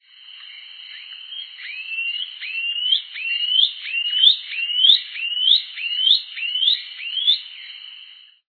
choquinha de garganta cinza

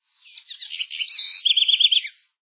corruira

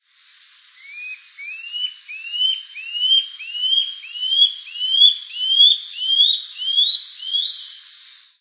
rendadinho

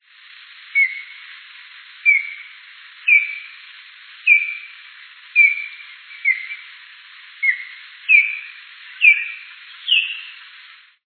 aguia pescadora

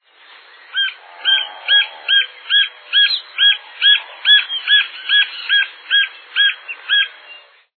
pica pau verde barrado

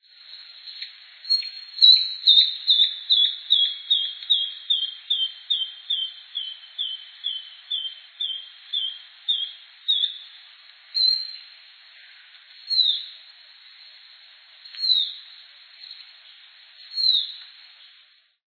enferrujadinho

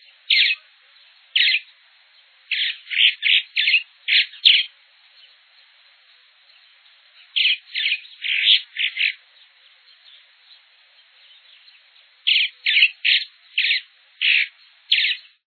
andorinha-domestica-grande

